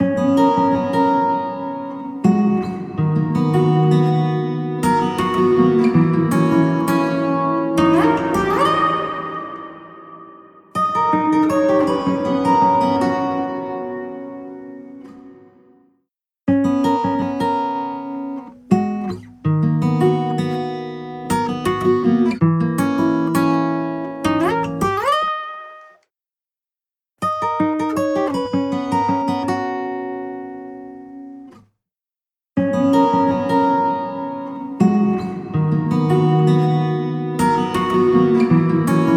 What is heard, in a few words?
Acoustic Guitar Metro Station Platform Stereo; Blackhole Immersive